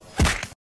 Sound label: kick